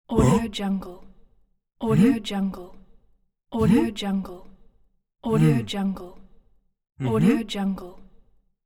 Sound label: man huh; minimal-studio